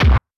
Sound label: Floor Hit; Super Smash Bros